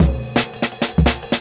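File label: Jungle Breaks, 170 bpm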